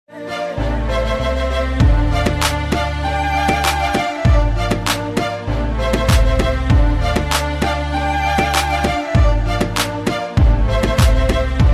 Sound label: ringtone; realme